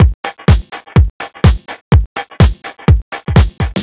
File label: Electro Haus No Hat, Drum Loops 125bpm; Nu Dance Electro